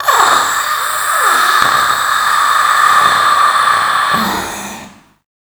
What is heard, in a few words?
VOICE FX, Dance Vocals